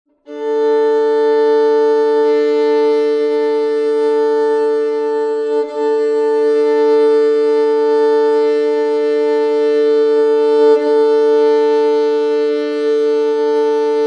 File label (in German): geige d a doppelsaite stimmen